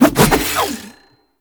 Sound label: Staff Spin Swing; Warframe; Duviri; Dax; Weapons